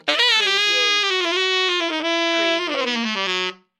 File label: Sax Run Descend